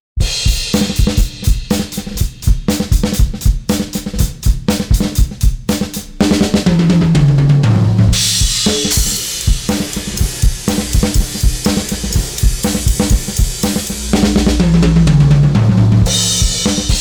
drums; smart reverb; AI down

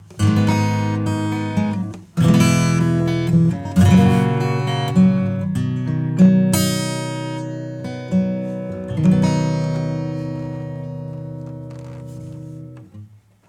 E Gtr Warm; Neural Q

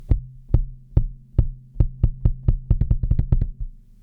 kick; Long and punchy

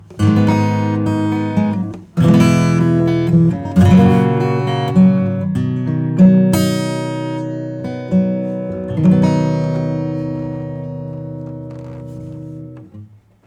Boost Acoustic; Gainstation

